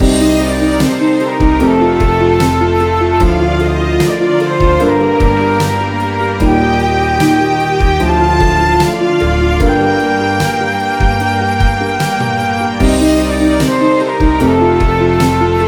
dramatic drum; by burak sound bank; dramatic dram vol 15

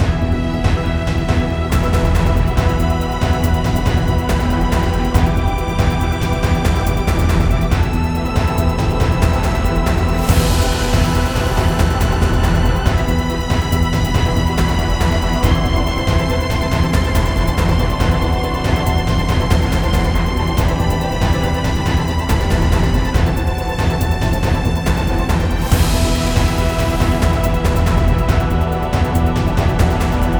tension; by burak sound bank; tension gerilim vol 49

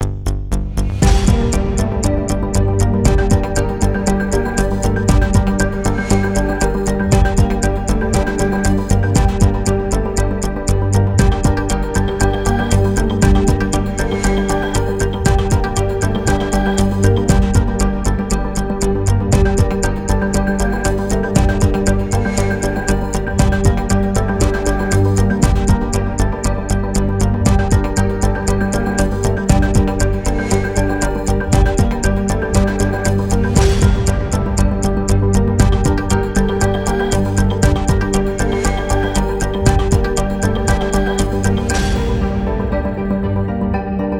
tension; by burak sound bank; tension gerilim vol 46

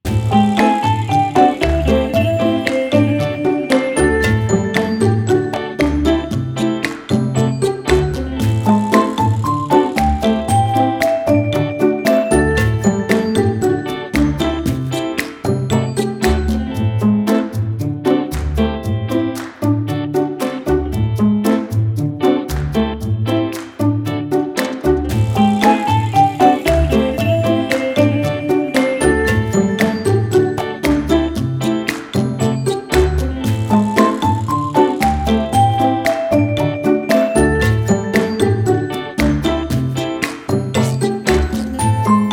sweetness pizz guitar; by burak sound bank; cheerful vol 2

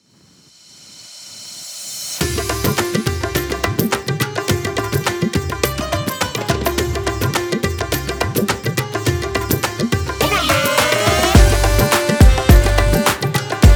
indian hint dance; By Burak Sound Bank; hint tema

